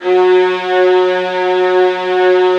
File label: VIOLINS G#, Synclavier Strings; ILIO